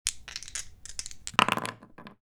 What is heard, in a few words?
Shake And Roll Dice